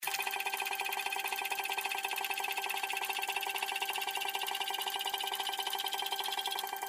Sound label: Animation Draw, Dice